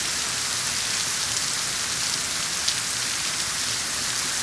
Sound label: water rain light loop